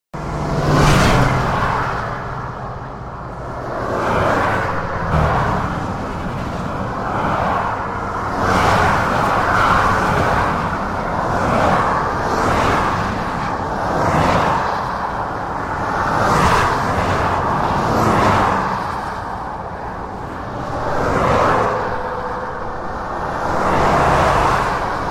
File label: Motorway, Traffic passing on a fast road.; Traffic and Cars